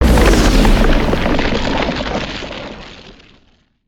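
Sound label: Earth Tornado End Blast; Spinjitsu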